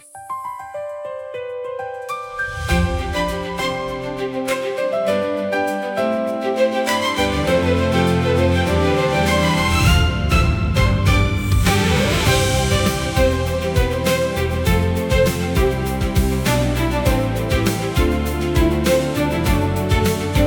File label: bright welcoming; guest intro